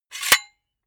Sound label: silicone gun empty pusher pull out fast; berufe; handwerker; soundpool